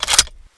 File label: mp40 clip out, weapons